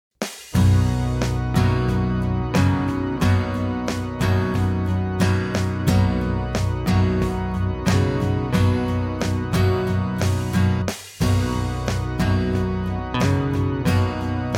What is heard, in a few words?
Mix No EQ